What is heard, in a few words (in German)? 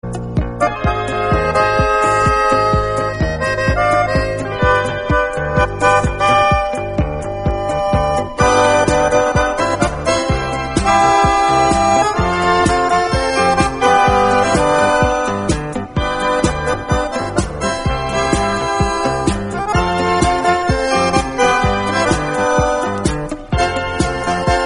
Akkordeon